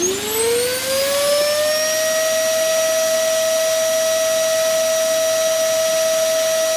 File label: vacuum; qs-housing; WestsideRP